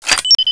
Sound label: message